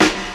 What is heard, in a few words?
snare one shot screech